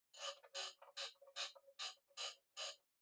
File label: Flip Clock Far Alarm Dial Fast